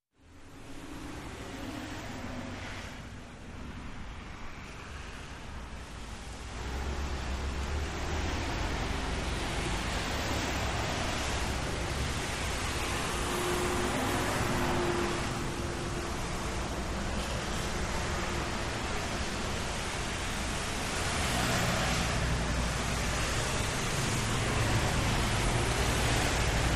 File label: Traffic Wet Highway, wet traffic